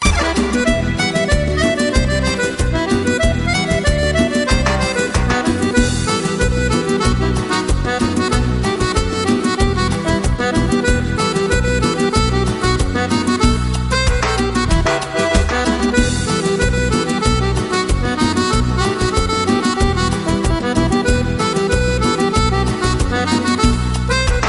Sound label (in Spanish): Cumbia